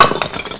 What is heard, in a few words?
Concrete; Worms DC